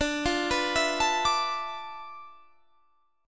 D Minor 6th Add 9th D, Guitar, Standard; Dm6add9 arpeggiated; JGuitar

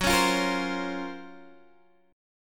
F sharp Major Sharp 11th F sharp, Guitar Open G; chordshape; down

